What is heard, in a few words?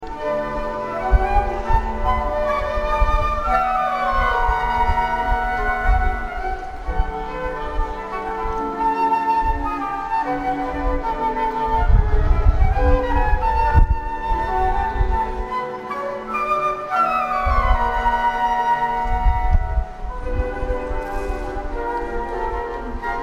JAZZ medoley, flute